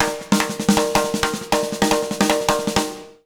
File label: 160 B BEAT, Jazz Latin Drumloops; USB Soundscan vol.08; AKAI